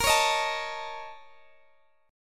A sharp Minor Major 7th Double Flat 5th A sharp Guitar Open D